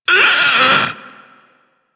Infested Splitter Split Top Vocal Pain

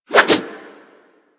Axe Small Spin Swing; Warframe; Weapons

